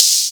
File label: Cymbals HH Open; Vengeance Samples